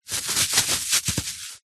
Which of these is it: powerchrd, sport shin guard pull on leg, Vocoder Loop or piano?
sport shin guard pull on leg